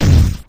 stop sand

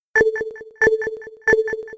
Signal; SSV-Music

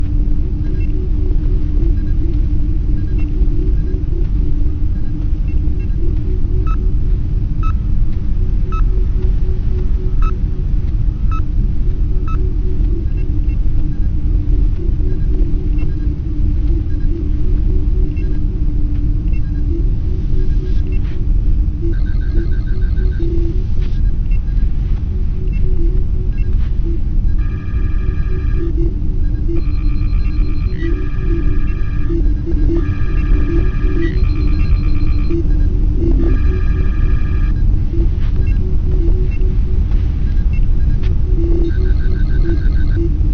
Bridge Ambience; SSV-Music

